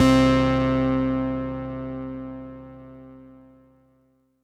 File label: Synth C, Synth Prophet One Shots; Electronisounds